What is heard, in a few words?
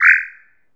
BOOKMARK OFF, SYS CANCEL; maimai